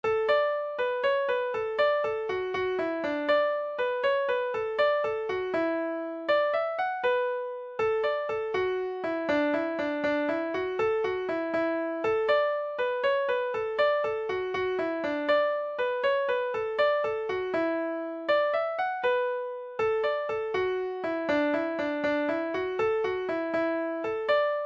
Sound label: strike gay harp; irish